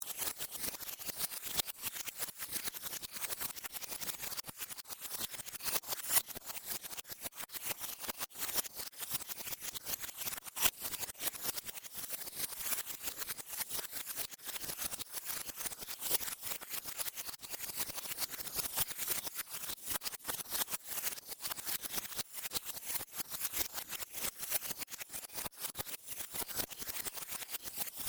Created Ambience Turning Pages Loop, Artificial Sound Effects; Various Ambience created through sound manipulation in FMOD